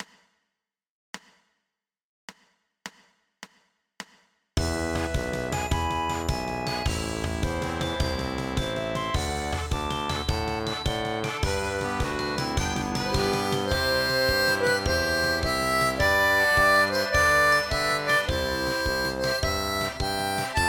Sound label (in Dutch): Auld Lang Syn met mondharmonica